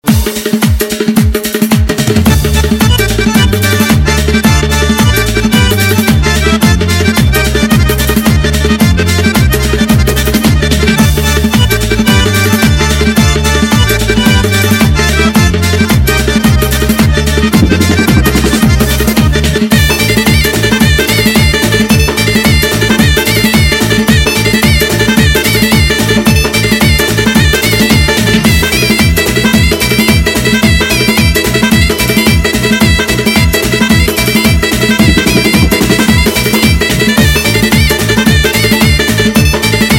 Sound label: lezginka